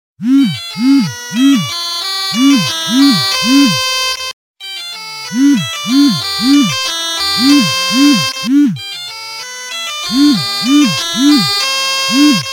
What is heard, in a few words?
nokia vibration